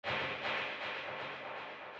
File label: Vacant FX, 120